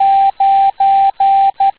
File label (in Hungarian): Távíró 9